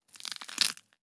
crackle rip tear celery

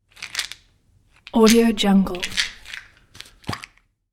plastic bottle

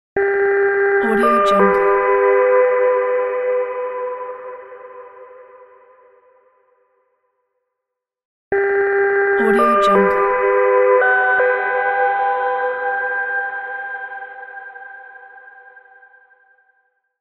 simple logo

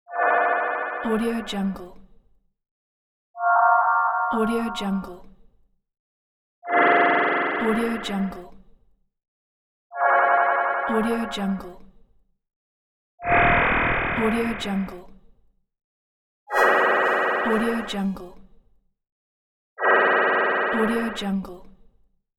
magic sound effects

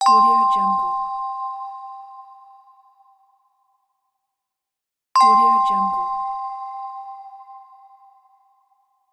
new message

